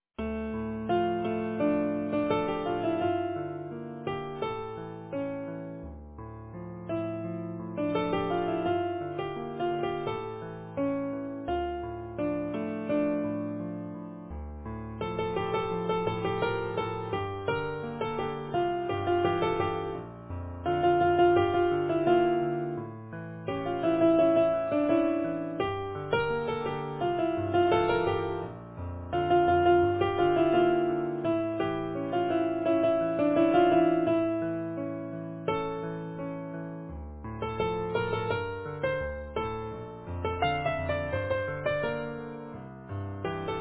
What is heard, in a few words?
Easy Piano